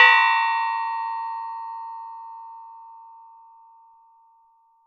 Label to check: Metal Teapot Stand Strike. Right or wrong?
Right